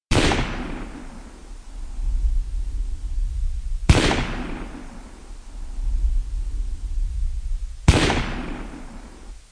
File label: rifle shots